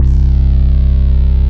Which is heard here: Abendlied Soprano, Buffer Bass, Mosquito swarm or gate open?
Buffer Bass